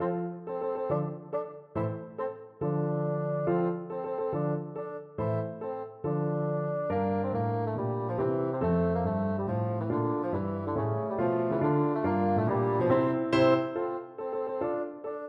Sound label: tchaikovsky nutcracker, bassoon